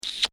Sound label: Long Kissing Smooch Kiss